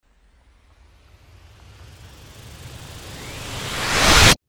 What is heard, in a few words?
build up